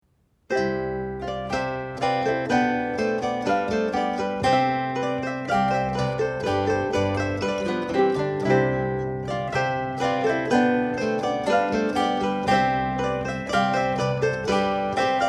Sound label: Marche